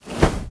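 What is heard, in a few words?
enemy attack wizard fire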